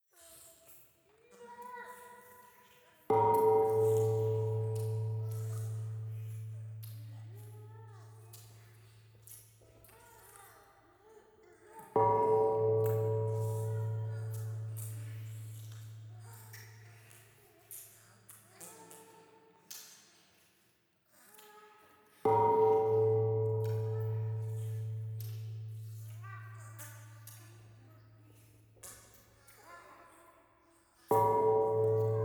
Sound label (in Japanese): イラマチオ 神社